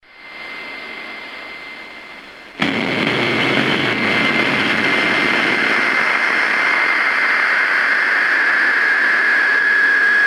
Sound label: Static